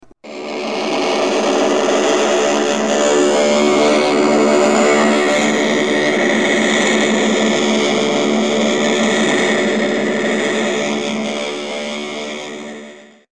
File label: radial engine; ramdemos